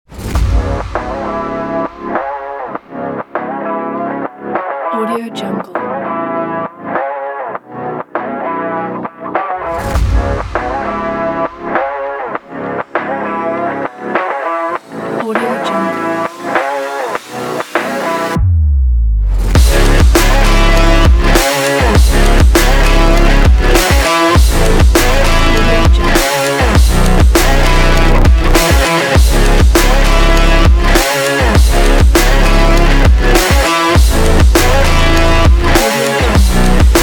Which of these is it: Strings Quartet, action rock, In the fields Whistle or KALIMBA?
action rock